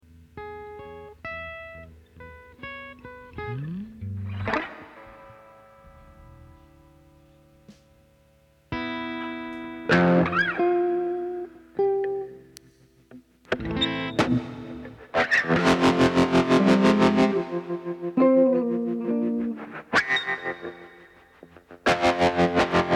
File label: Drum Samples Mix